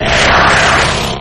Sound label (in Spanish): Grito de Kadabra; Actualizado a la sexta generación